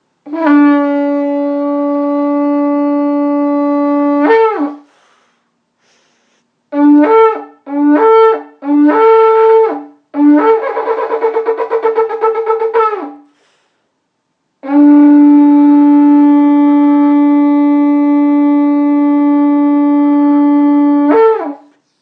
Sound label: shofar